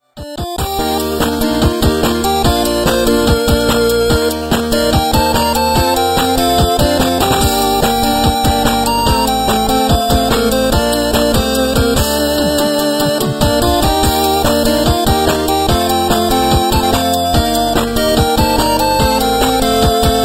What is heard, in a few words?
8 bit remix; pokemon